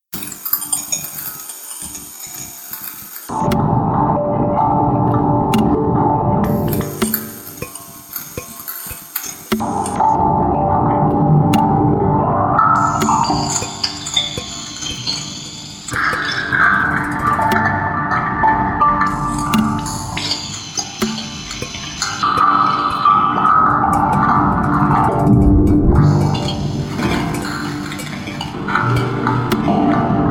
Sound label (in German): Nobile Rauschen